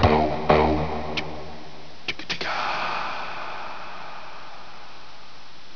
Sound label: BOW BOW